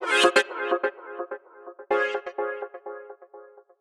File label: 126 F MUSIC; funky; Loopmasters; Funky Underground Vol 2